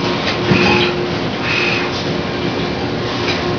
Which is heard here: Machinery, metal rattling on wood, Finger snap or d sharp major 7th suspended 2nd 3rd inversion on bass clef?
Machinery